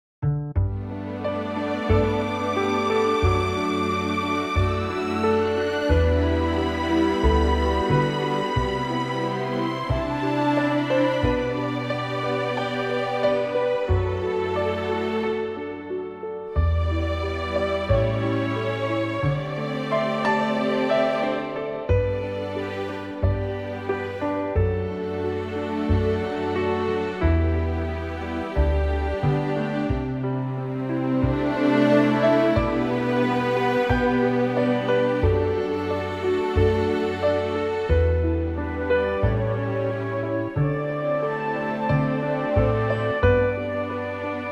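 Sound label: G orchestral, backing tracks for male vocals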